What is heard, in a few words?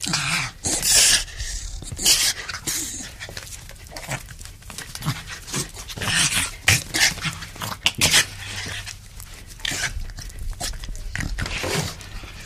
Pit Bull Panting Tug